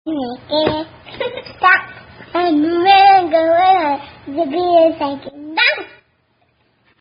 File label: 2 Year Old Singing; There's A Place In France!!! Bad Willy!!!!